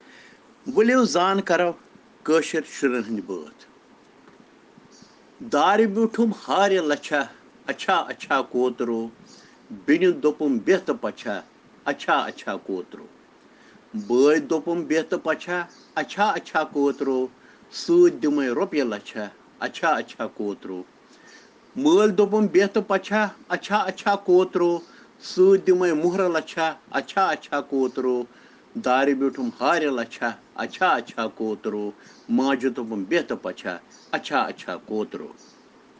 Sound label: Children Rhyms in Kashmiri